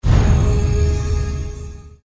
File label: smoke; Red Riding Hood